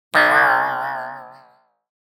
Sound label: Impact Comic Spring Hit Metal, Faster Vibration; Hollywood Edge; sound designer's toolkit v2